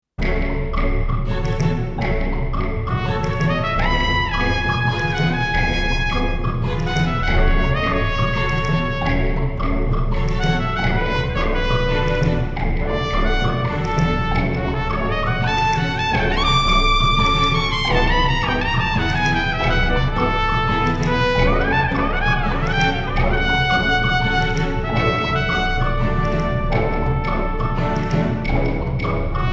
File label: Cornet Solo